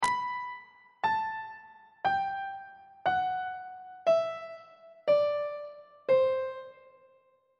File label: b sharp lydian mode descending on treble clef